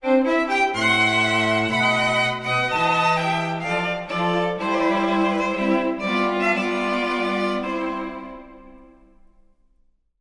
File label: Mendelssohn Wedding March Recessional Op 61 No 9